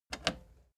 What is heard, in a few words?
plastic button slack push